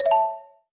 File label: Button; UI; Level 3